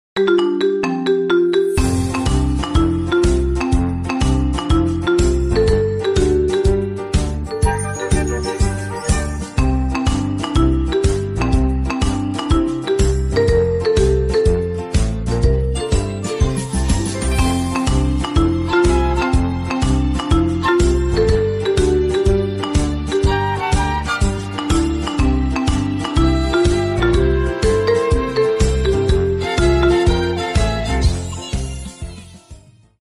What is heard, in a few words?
Marimba Remix